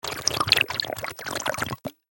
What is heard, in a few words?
Drink Water